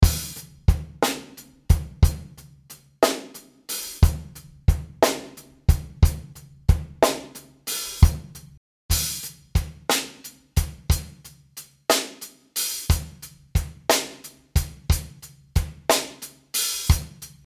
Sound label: Snare